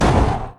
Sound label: iron golem hurt; Minetest; mineclonia; mobs mc